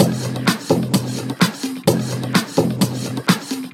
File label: Latin House Loop 128 BPM; Vengeance Samples